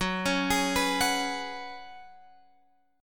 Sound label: F sharp Suspended 2nd Suspended 4th, Guitar, Open F; F#sus2sus4 arpeggiated; x,13,13,15,14,13; chord shape